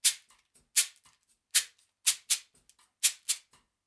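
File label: shaker, Percussion Loops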